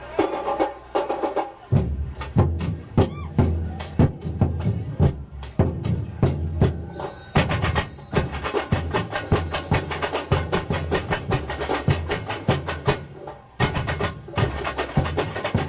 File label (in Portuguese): Break Mocidade, Ao Vivo